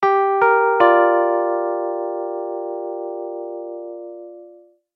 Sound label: rhodes